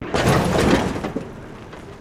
Vehicle Suspension, hits & rattles

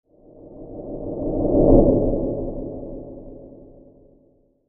Space Ship Pass By; spaceship fx

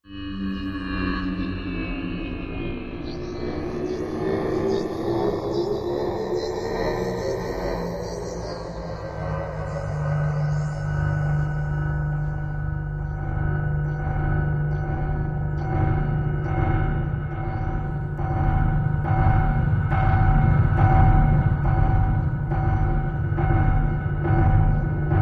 Haunted Factory Metallic Hits; Dew Line

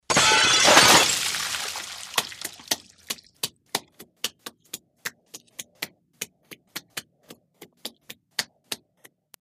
Aquarium Smash Pour, crashes & creaks

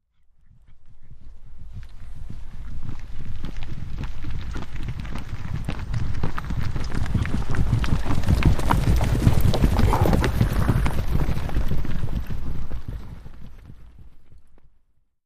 2 Horses Canter By

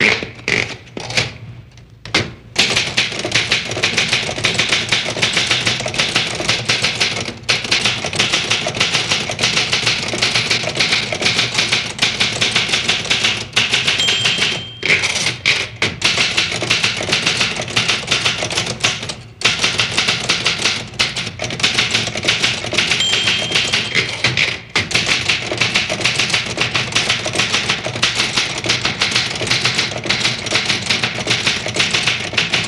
Typewriter Manual; typewriters

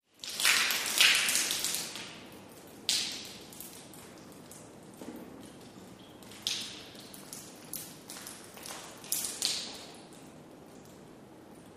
Water Interior Dripp; drips

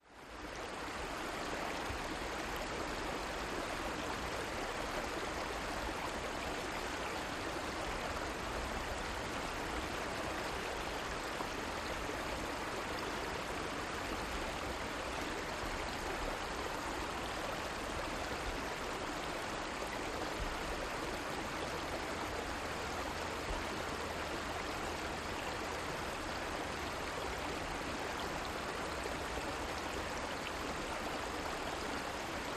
Small River Some Clos

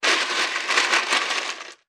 Ice Being Moved

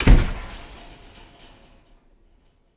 Garage Door Slam Rat

